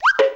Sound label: whiz